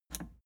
plastic button scratch smooth release disable

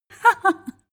female laugh breathy

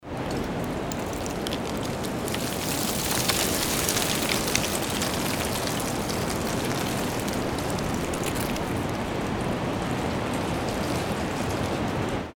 rain and wind

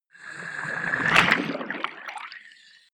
filling paddling pool

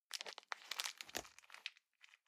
plastic bag small pocket stick in soft

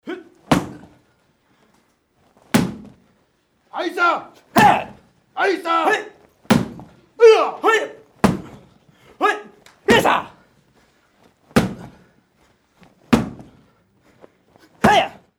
karate kick shield

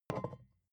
vodka put down tipping

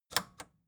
record player power click on slow

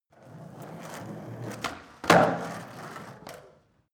skateboard indoors drive flip heel

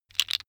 tiles wood

